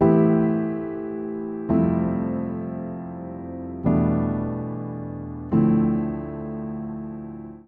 Progression i-VI-v-iv-i-VI-IVsus4-III A Minor 125 bpm, Upright Piano; MIDI Clips; City Driving; Upright Piano by Spitfire Audio; Ableton; Factory Packs